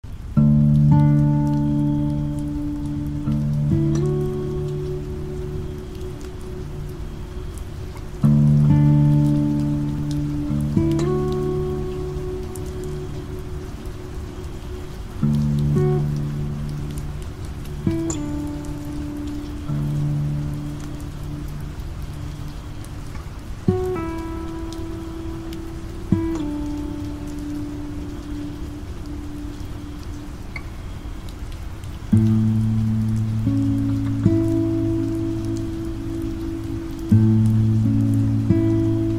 Guitar and rain

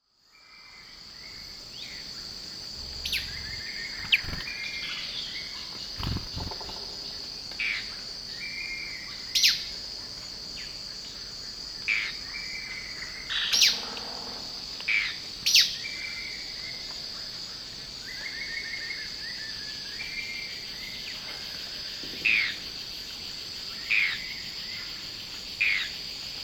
Thamnomanes; پرنده بوتهخواهها

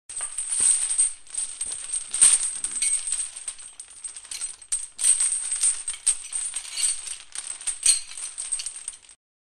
shackles; غل و زنجیر

